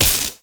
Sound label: lightning impact; skyrim